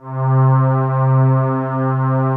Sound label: str c2, SYNCLAVIER Vocal String; Retro Sampler